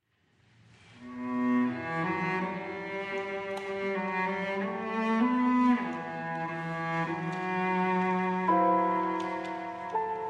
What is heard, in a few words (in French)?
elegie pour violoncelle et piano